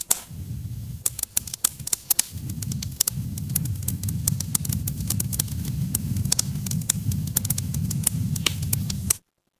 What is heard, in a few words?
ambience fireplace